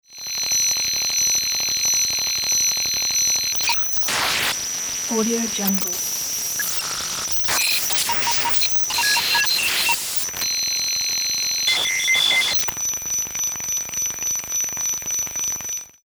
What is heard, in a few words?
short encrypted alien message